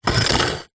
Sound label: brick scrape over light dirt on concrete; foley